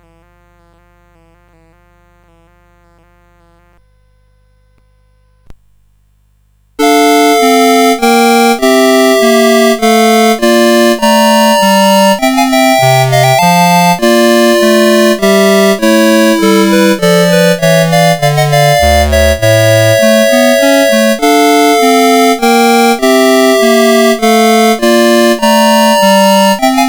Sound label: Brandenburg Concerto No1 Trio, CSG8580R5